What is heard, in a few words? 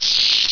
snake pain; wanted